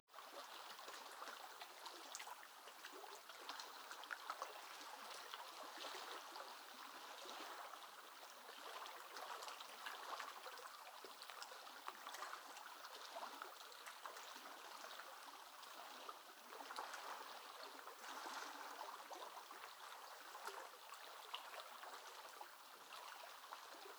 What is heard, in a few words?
ambience waves; Rust